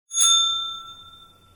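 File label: bicycle bell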